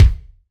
BD, BASS DRUMS; EdgeSounds

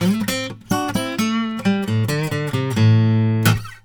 Blues Guitar; ILIO; Fretworks; LICK

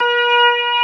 B3 C 3, ORGAN; VOCAL ORGAN

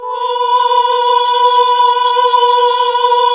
OHH B, Nuns Ooohh; Sound & Vision - Gigapack II CD 1 (Roland); HAL NUNS AAH OOH

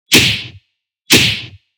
Slap, snare

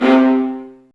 STR, STRINGS; Roland - LA Composer vol. 1

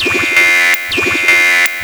StarShip Sounds

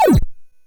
Drums, Hits; DCM8

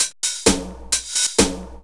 130 trance break, Drum & Perc Loops

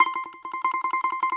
BAGLAMA T1CL; E-MU Producer Series Vol. 7 – Old World Instruments

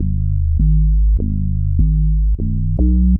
Classic Bass, Drum N Bass; Sonic Foundry (Sony Creative Software); Knutrix Drum & Bass

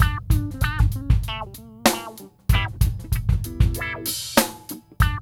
LOOP

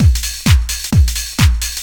dr t 130 trance 2

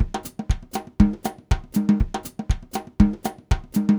Voodoo Groove, Latin Percussion; Sonic Foundry (Sony Creative Software); Joe Vitale Latin Percussion; Grooves

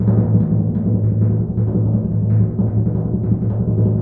TMP A#, Synclavier Percussion; ILIO; Synclavier Percussion 2; World & Orchestral

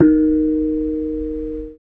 KENONG; Spectrasonics; Heart of Asia

